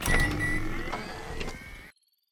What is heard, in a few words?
Server-Turn-On